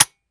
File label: weapon fire first; gmod; viper